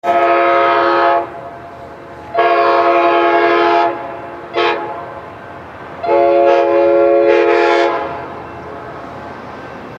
buzina de trem efeito sonoro

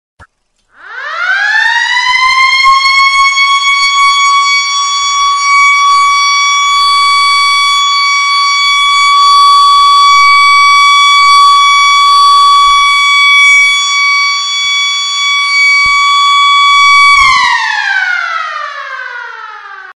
alerta maximo de evacuacao

